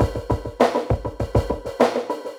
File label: drloop, drumloops, Vinyl Attack; Masterbits